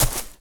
grass, player steps; quake2

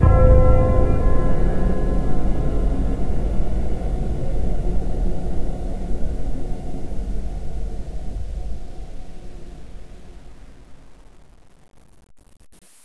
hell

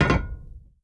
mech, player steps; quake2

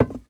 tile, player steps; quake2

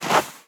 snow, player steps; quake2

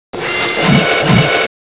clawing; rustbit; svencoop

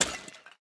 junk, player steps; quake2

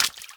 splash, player steps; quake2

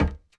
clank, player steps; quake2

